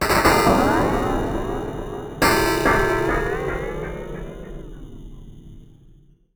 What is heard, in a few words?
Bit Crush & Moog Delay, Wire & Tin, contact mic